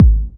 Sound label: BD, BD Dance; Sound & Vision; Gigapack I CD 1